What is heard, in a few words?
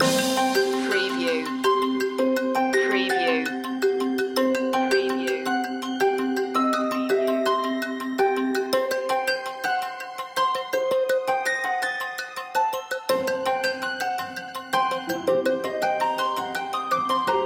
quirky creatures loop